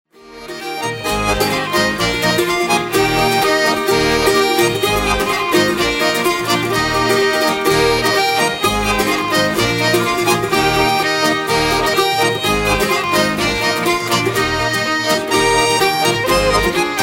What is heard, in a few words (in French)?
bourree 2 t